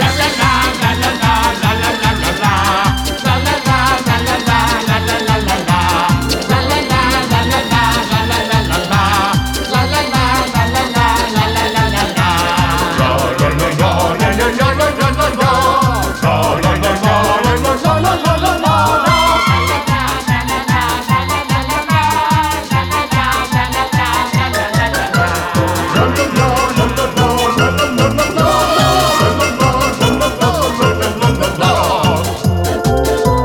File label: Music - Race Loop; Beanstalk; crash tag team racing gamerip